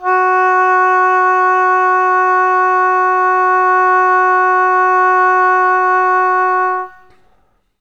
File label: ob F#3, Oboe; Best Service ProSamples vol.51; Classic Orchestra 2